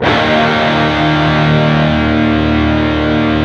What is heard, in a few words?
PRS, Guitars, PWR SLID